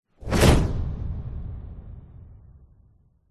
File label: science fiction cannon gun blast